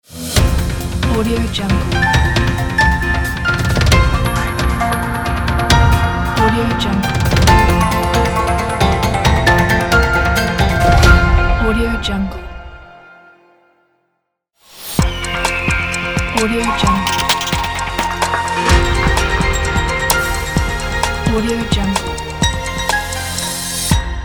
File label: news block logo pack